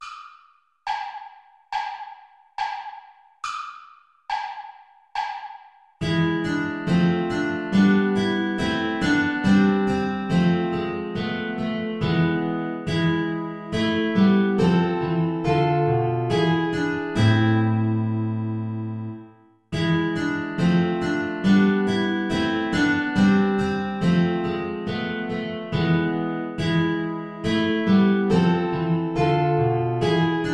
play along without guitar; bach johann sebastian; wie soll ich dich empfangen

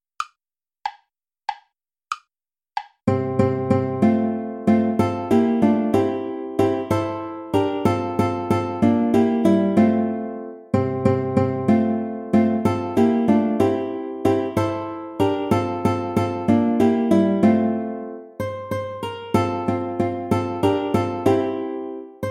traditional play along without guitar; asikhatali

